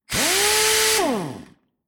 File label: Industrial Air Tool Pneumatic Power Wrench Wheel Nut Tightner Mechanical Run; Power Tool Sound Effects